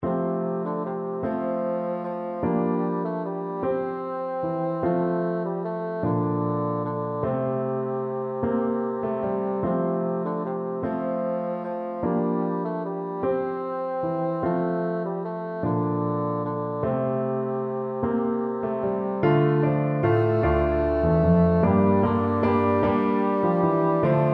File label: skyeboat, bassoon